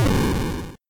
acorn bomb; project hawk

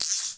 ion rain; endless sky

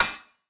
anvil; project hawk

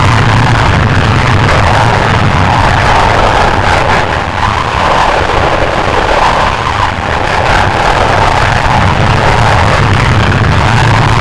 turbojet afterburner; rigs of rods

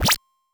collect; roomforchange

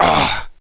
pain

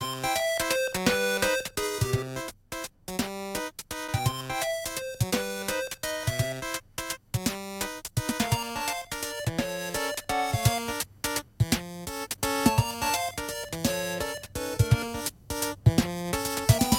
forest, music; project hawk

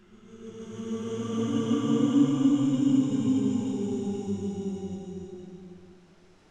ghost

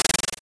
snake hurt; project hawk

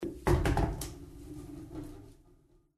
pocket; agent 8 ball

